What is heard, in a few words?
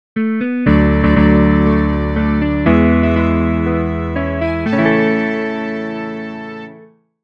victory